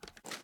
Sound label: reload tilt, weapons; xmag; gmod; viper